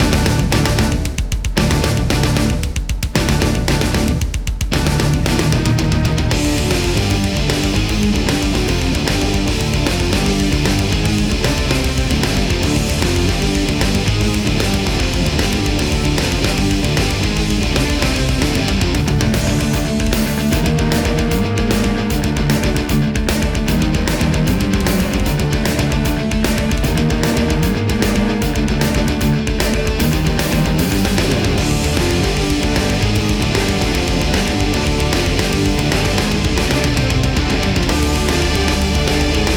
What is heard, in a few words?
Metal Theme; War of the Roses